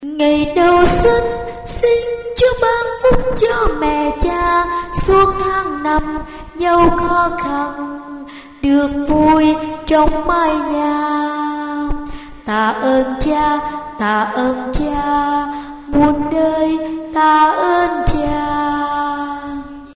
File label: basso tenor